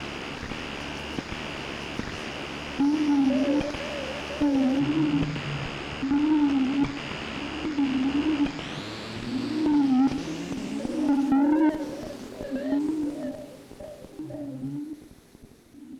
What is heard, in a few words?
texture loop 120bpm; ultimate pack